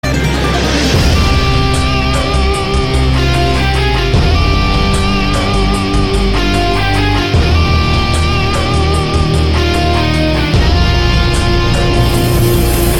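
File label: background music Ringtone; Sanam Teri Kasam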